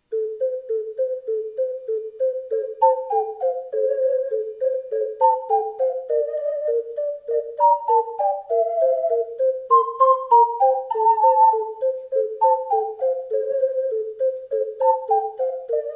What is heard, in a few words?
jingle, ocarina